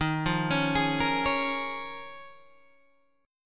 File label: Eb 7th Suspended 2nd Suspended 4th Eb, Guitar Open G